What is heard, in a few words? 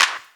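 deep house clap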